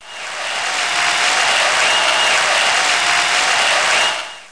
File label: applause